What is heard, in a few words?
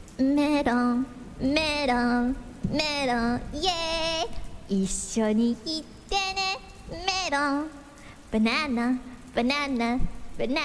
what is she singing